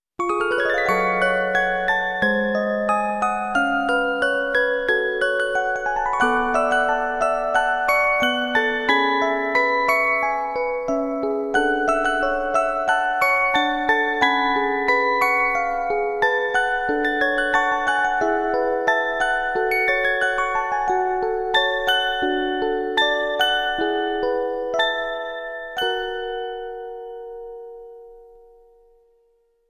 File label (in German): Orgel